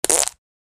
fart

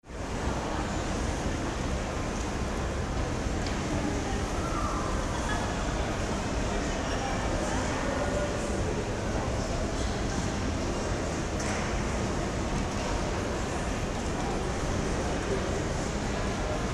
shopping mall, ambience sounds

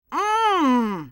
mmm, voices

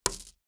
coin

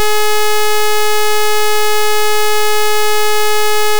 beep

